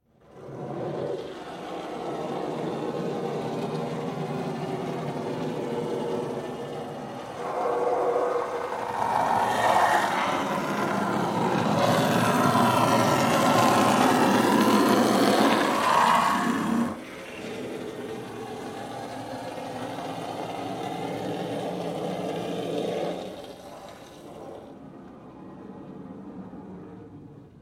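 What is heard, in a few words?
Water Spray Interior Car By Hard Long; Car Wash; Spraying; Big Room Sound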